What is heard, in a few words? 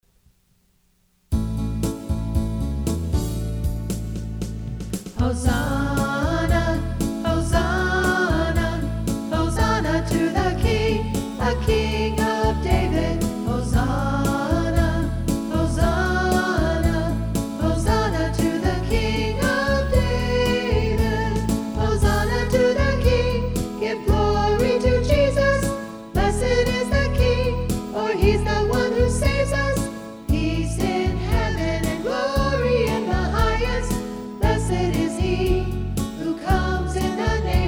VOCALS